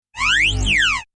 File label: Spaceship Alarm